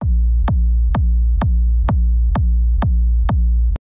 Minimal techno

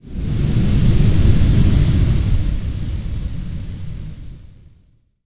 Ship Thruster

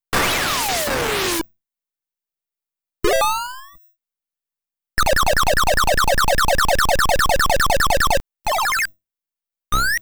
S.E. COLLECTION; Bomb Jack

